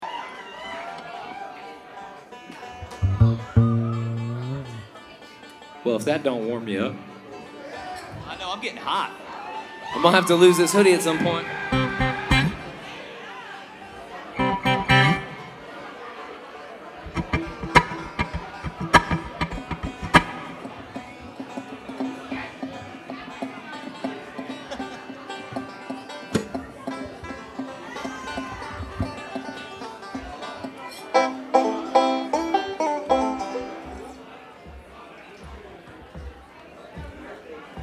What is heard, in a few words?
tuning; Bluegrass